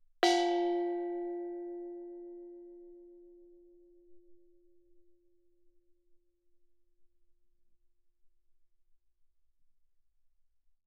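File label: thai gong F4 fortissimo, Percussion gongs & tamtams; thaigongs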